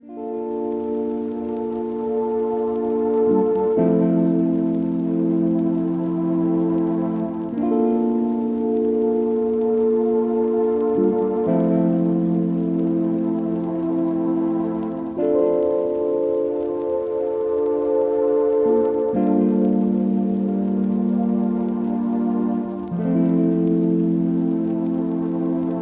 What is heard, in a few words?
bgm